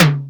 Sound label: Tom, Roland Digital Drum Brain DDR-30